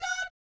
DOOT F#5, Vocal, Jazz; ILIO; Vocal Planet; Jazz & FX; JAZZCOMBO

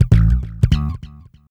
BASS, Hip Hop R n B; USB Soundscan vol.19

